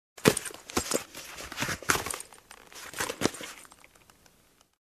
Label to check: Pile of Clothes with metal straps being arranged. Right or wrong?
Right